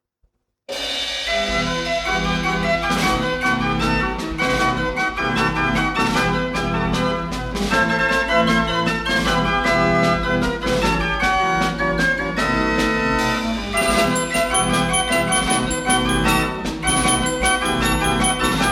drehorgel; olympia orgel berlin 1936

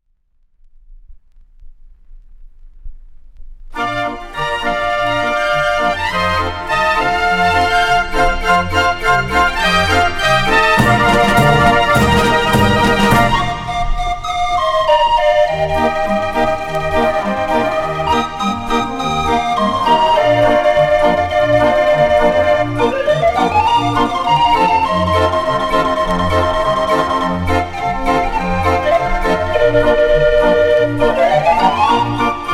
saint tropez, draaiorgel; de cementmolen

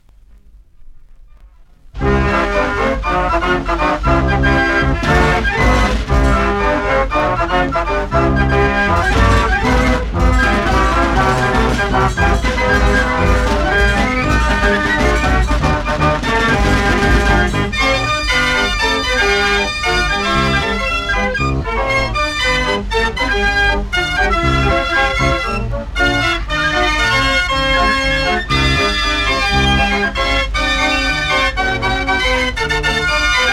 fair organ; fair organ wonderland

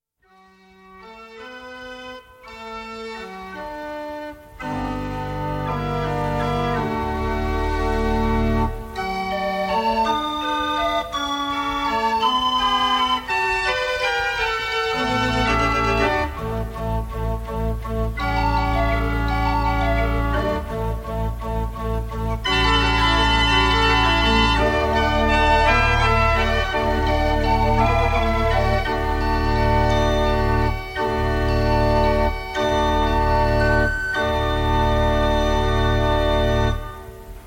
Tres Jolies wals fadeinout, draaiorgel; meimorgan

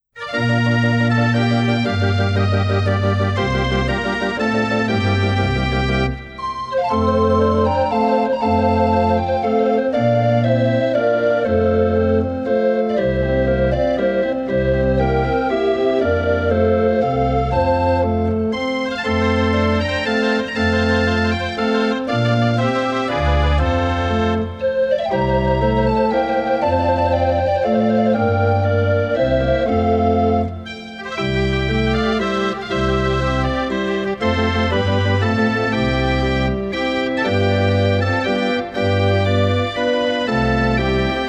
draaiorgel; de columbus

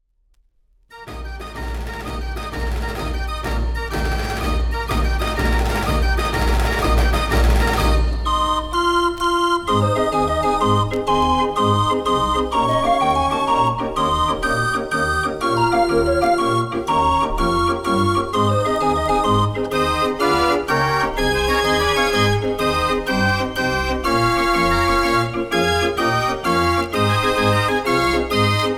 medley dutch, street-organ; Street-Organ Favourites

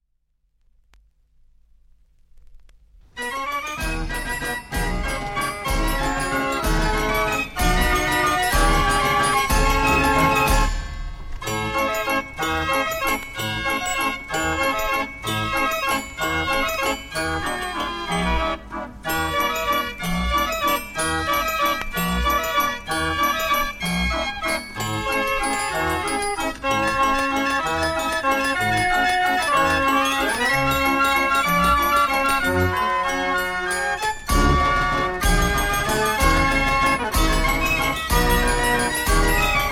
65 key gavioli kermisorgel; Forrest's

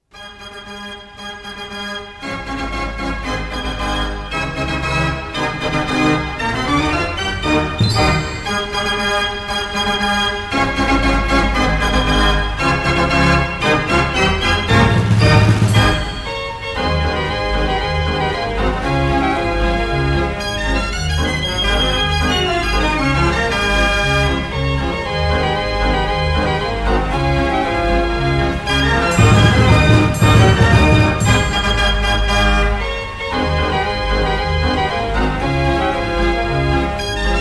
gavioli fair organ

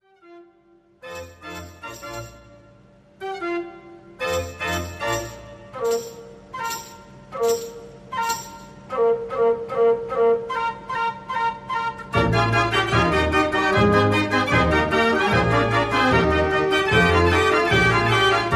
Can Can, draaiorgel; knoptikum

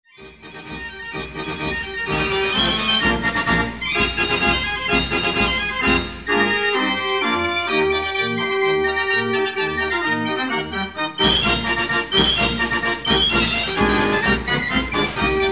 gavioli orgel; jef peeters

